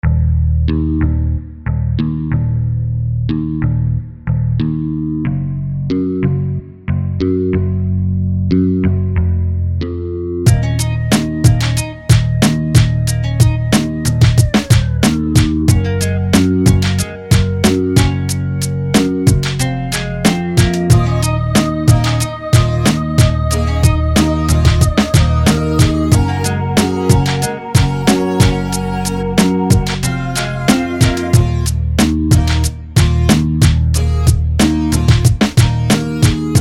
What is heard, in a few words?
Bas 92bpm